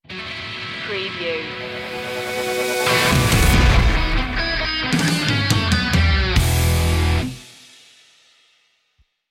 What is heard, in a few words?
thunderbolt sting